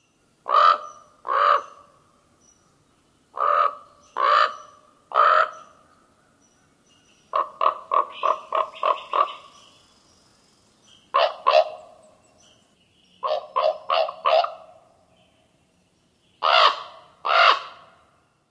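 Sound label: Common Raven various calls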